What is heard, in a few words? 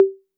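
Roland808 high conga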